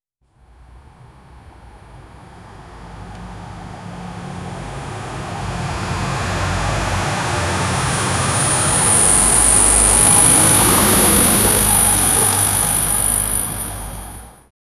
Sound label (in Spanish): personaje cartoon cae, falling